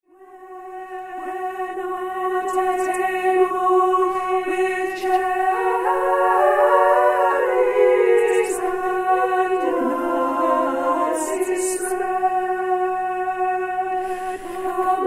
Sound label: Laughing song